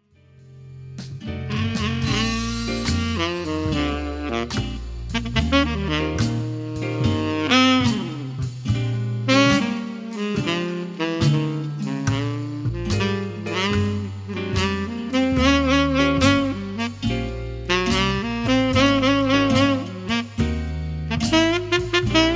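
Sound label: Bluesy Tenor, sax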